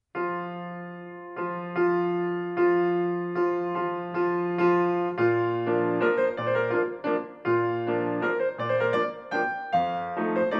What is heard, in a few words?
chopin mazurka posth b flat major